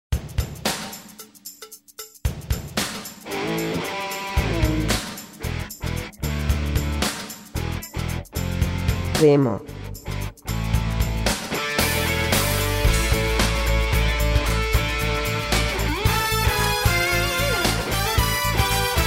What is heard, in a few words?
Rock Roll